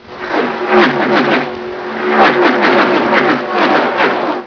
race car

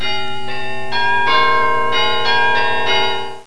church bells

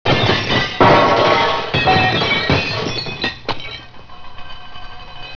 breaking glass

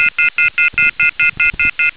phone off hook

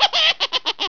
cackle, cartoon

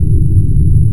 engine, aircraft engines

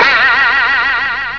twang, cartoon

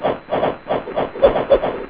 fight motion; weapons

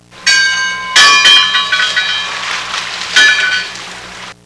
buoy

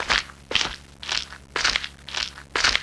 gravel walk

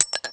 ammo casings; weapons

